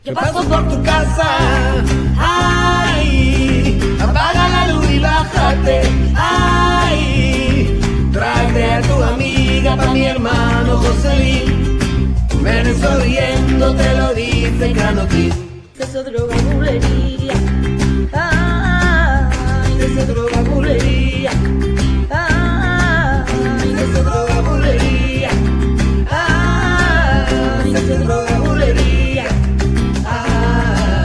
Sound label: Flamenco Chill